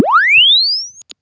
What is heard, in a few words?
Press Two Sides Chirp; Samsung 4 Recordings